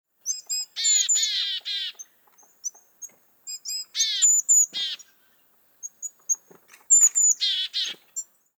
kogara

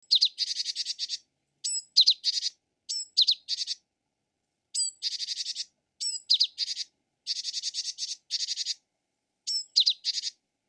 shijuukara

